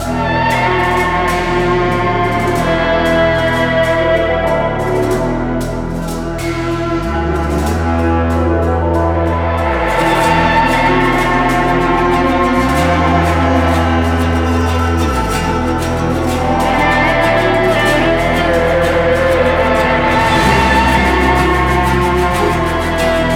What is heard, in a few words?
Victory (Low); assassins creed origins unofficial soundtrack ps4 windows xbox one gamerip 2017